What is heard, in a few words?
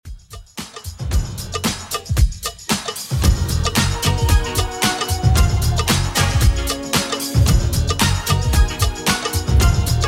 90s Redrum 113bpm